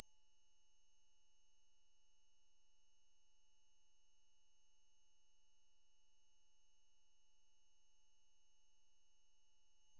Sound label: vhs tv